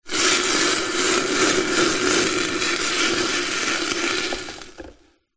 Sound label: household kettle empty hot element swirl dregs water sizzle hot